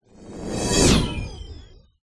science fiction robot burst

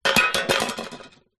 metal money tin lid set down on table vibrate; zapsplat

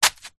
foley footstep single flip flop dirty concrete

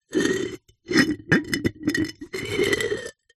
household pot ceramic base lid scrape

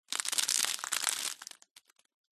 foley debris dust dirt fall land plastic sheet

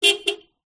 car horn beeps x2 external toyota corolla

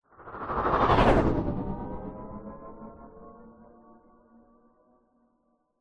science fiction hovering vehicle pass by fast

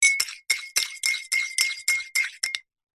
stir coffee mug spoon; food drink; zapsplat

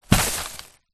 shopping bag plastic full put down; foley

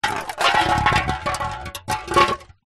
food drink soda can empty spin wood deck

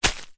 footstep single shoe wood chippings pile; foley; zapsplat

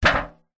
impacts metal thin foil baking tray hit drop; zapsplat

